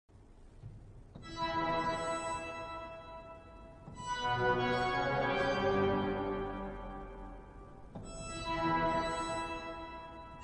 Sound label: Displaced Octaves